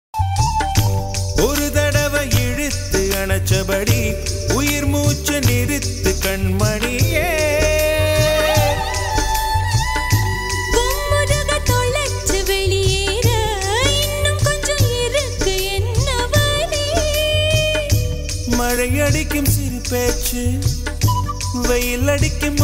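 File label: tamil songs, melody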